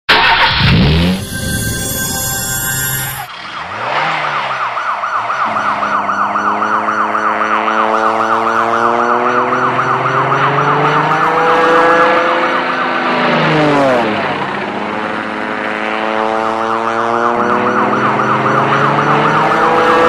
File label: Police threat, ringtones